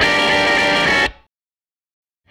SG Guitar Loop

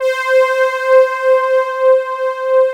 LUSH BED

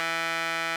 RMI; Sonic Reality - Vintage Timetraveler

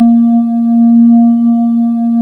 Synclav FM, WIND, A#; Big Fish Audio

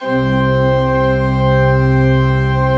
C1, Cathedral Organ, MANUAL PLENO; Propeller Island

